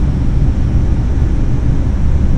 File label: Sound glider